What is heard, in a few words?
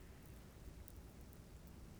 pulses, BALAENOPTERA; MONACO 2022-07